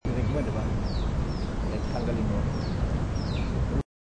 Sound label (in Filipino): sisiw